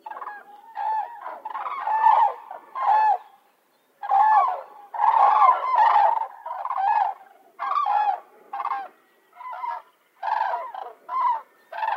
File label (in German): Kranich